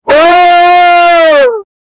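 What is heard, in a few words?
sound of wolf getting damage, injury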